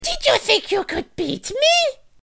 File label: death; freak fortress 2; cream